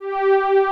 ST SA, SYNTHESIZER ANA STRINGS; AKAI S6000 CD-ROM - Volume 1

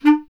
B. Clarinet

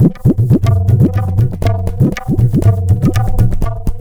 Tabla, 120 BPM; Zero-G; Pure Tabla

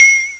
japanese bell; Amiga Soundtracker Sample Packs

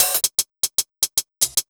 HH, Ragga & Dub Reggae; USB Soundscan vol.27; AKAI

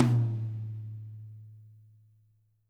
TOM, WARM+FAT KIT; Spectrasonics - Burning Grooves CD2

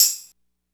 DR660 DANCE, DRUMS PERC; BOSS; Rubber Chicken Software Sample Bank

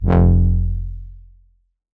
bass F1, Korg MS-20; Polyend; Simulacrum Sounds; 1 Shots; Synths

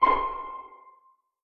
string pizz C5 pp; Sound On Sound - Peter Siedleczek's Orchestra

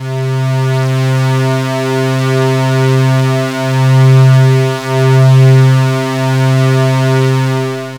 Synth, Raw Analog Multi's; Dream Zone

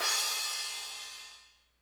crash, Hardcore Breakbeat; Big Fish Audio; Hardcore Breakbeat Action; Rhythms 4 Life 151 Ebmin

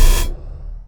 DRUMMM FREAK, DRUMS + PERC; Rubber Chicken Software Sample Bank

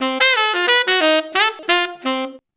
SAX C, ACID JAZZ; Best Service; Advanced Media Trax Modern Composer

